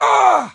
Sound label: wound, stalker